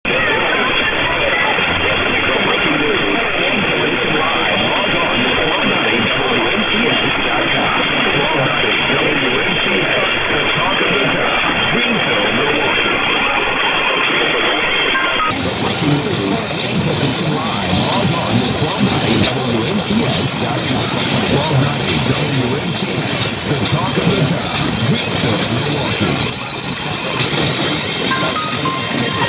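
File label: AM radio station, Greenfield WI; mw dxing; wmcs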